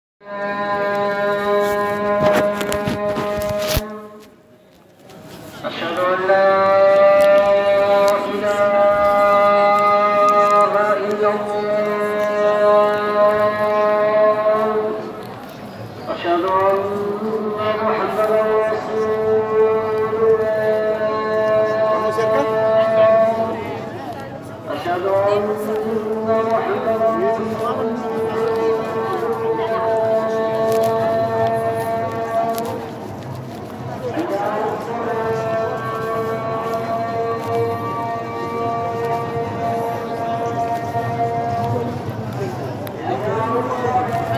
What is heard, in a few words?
Muezzin; Visite de Chefchaouen; Médina